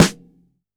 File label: Snr, drum